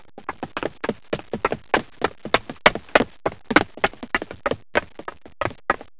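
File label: footstep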